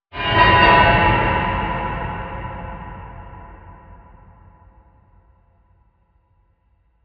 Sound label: grill Tray Flanged